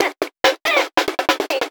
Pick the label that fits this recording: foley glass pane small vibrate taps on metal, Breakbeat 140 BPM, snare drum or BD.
Breakbeat 140 BPM